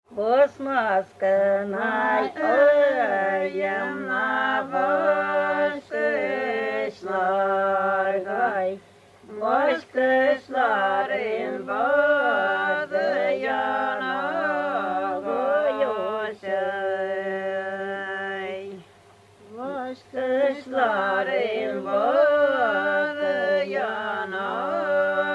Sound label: tatarstan, polyphony